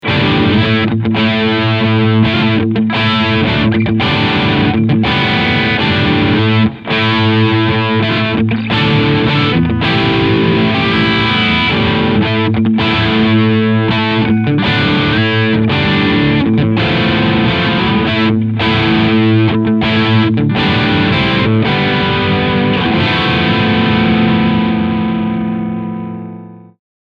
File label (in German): Crunch Tone12 Uhr EQ deep